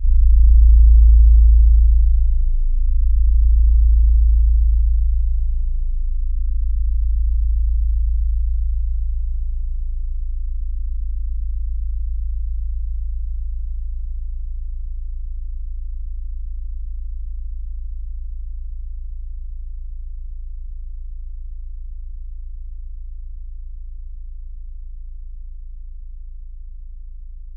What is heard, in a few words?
LOW A#1; Q Up Arts; Denny Jaeger Private Collection